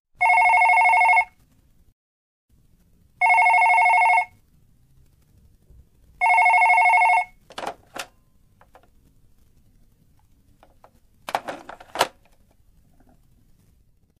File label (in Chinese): 电话铃声; 钟声; 广播室铃声系统